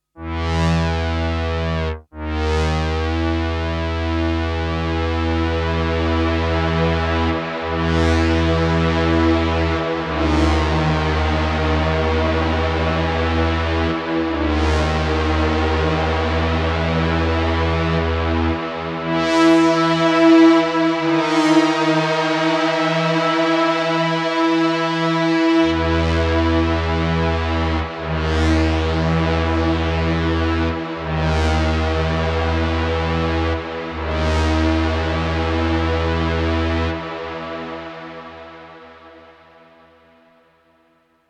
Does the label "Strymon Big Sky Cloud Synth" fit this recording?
Yes